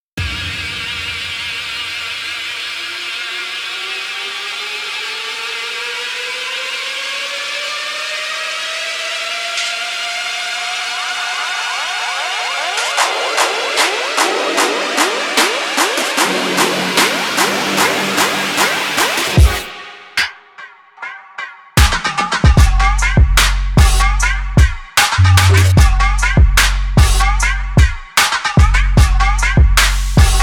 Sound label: Trap; 리듬BG